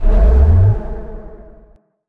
parting shot return; weapons; dota; muerta

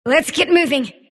sylph move pain; dota; dark willow

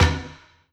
store add to cart, ui menu

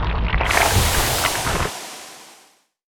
magma; weapons; dota; snapfire

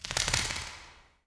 bat flaps; ambient soundscapes; diretide batflaps; dota

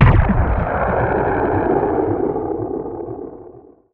stomp cast layer; weapons; dota; elder titan

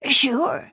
move pain; snapfire

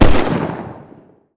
armor shredder attack; weapons; dota; snapfire

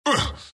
grunts; dota; skeleton king

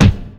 attack; weapons; dota; marci

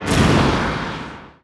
arrow, weapons; dota; mirana

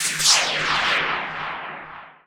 imp damage; weapons; immortal; dota; enchantress

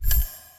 rollover, ui; dota

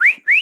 hi five; dota

